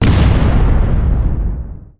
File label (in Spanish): Error Explosión